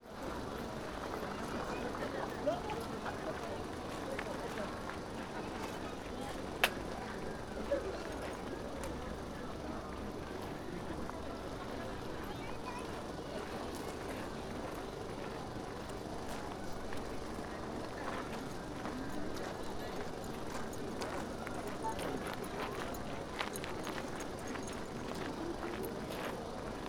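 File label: parking, Parking area in front of Gokoku Shrine; shinobuyama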